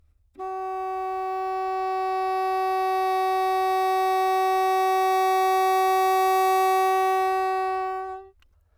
high, anechoic classical bassoon; OPENAIR

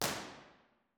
dixon studio theatre; b-format; university york

